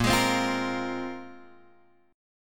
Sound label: Bb 7th Suspended 2nd, Guitar, Drop D; 8,11,10,10,11,x